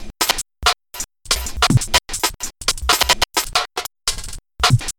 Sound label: beat slicer; Max